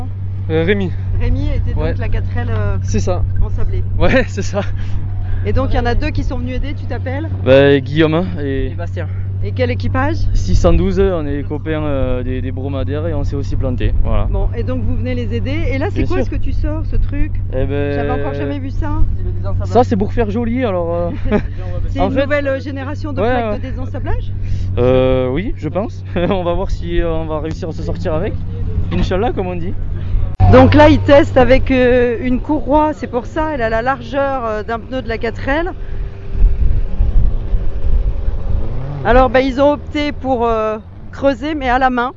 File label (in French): ambiance sable